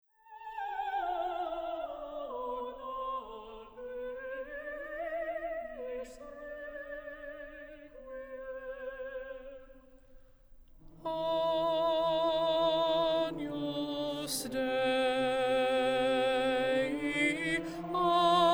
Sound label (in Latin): Agnus Dei, Requiem; requiem verdi